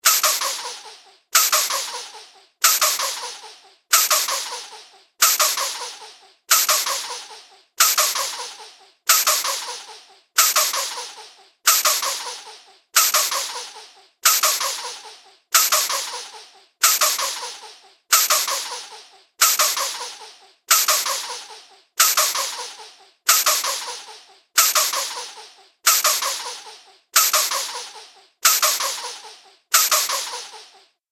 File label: Cartoon Repetitive Pump; Cartoon Sound Design Sound Effects